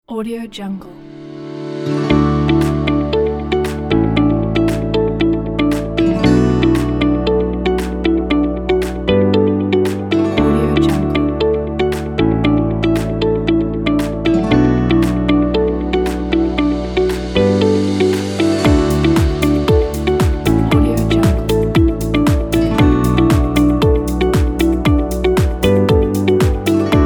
business corporate

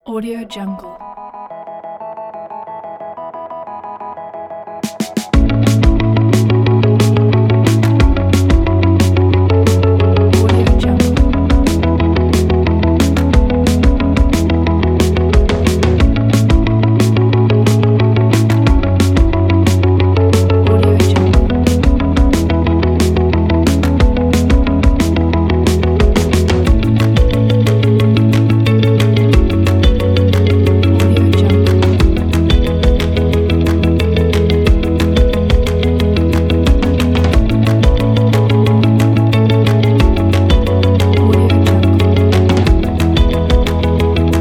indie rock pack

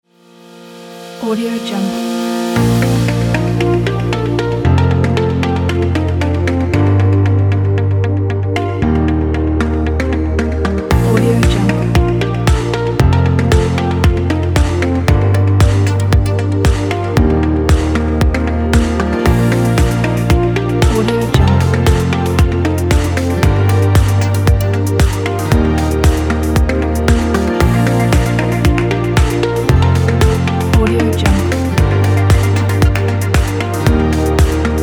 interest motivation pop

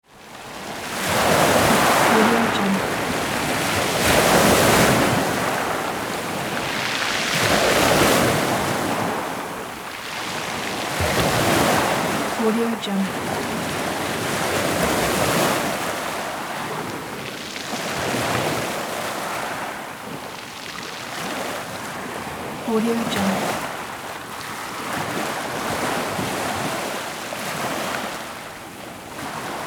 ocean

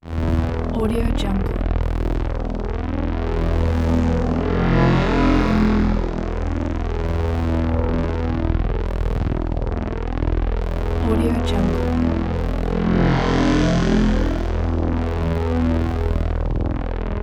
scifi drone

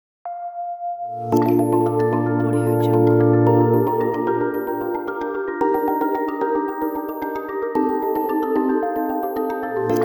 dreamy opening logo

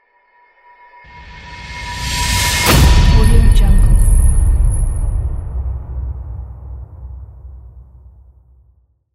whoosh metal hit; archisound

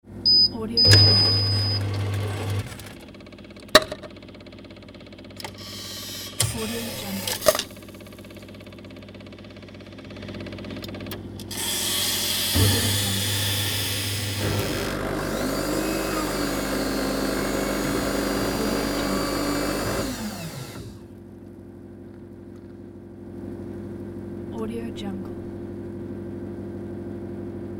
automatic espresso machine

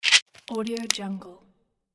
pill bottle

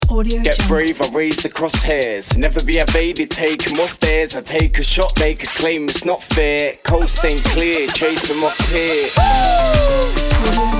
latin salsa hop

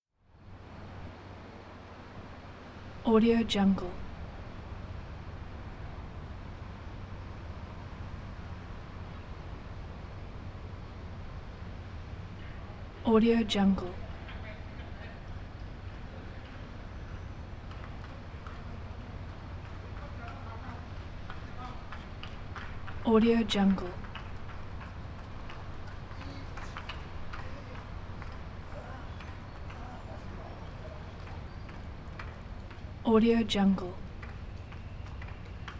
kyoto japan

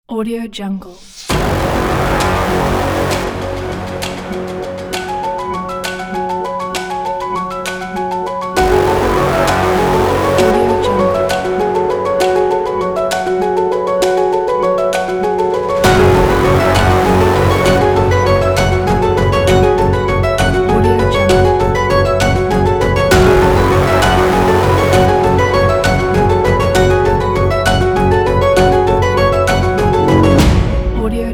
hybrid epic; harmony-music

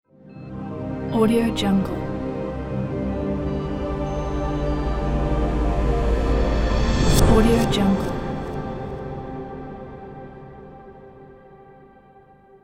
classic native logo

